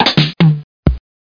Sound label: DrumKit; music mouse demo